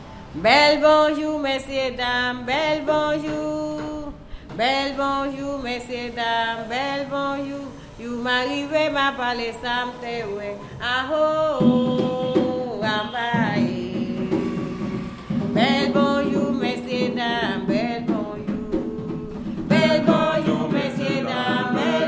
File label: merengue